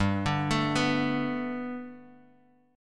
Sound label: G Major Add 9th G down Guitar Open G